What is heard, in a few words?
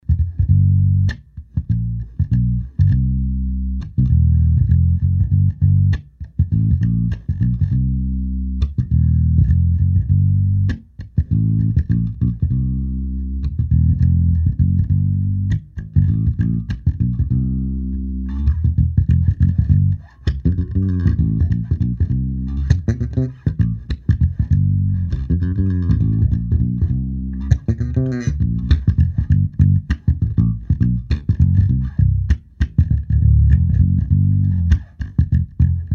AMP Bass D6